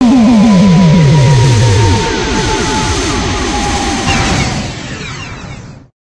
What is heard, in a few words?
Flying Saucer